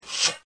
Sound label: Blowdart